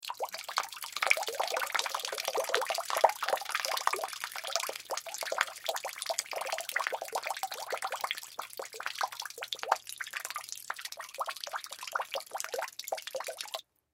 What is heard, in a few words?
Drips Pour Splatty, Water Drips Pour Splatter; Dripping; Blastwave